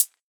Grit Hihat; Cymbals

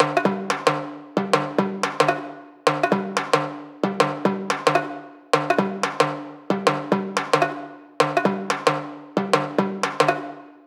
Orchestral Percussion - 90BPM; Percussion Kits; STEMS; Ghosthack; SkyRealm; Kit 13 - Leap Of Faith

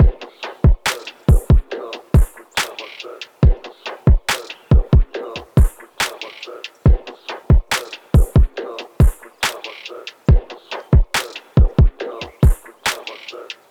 Drum Loop - 140 BPM; Wanted; Cymatics; DOPE DRUMS VOL. 1

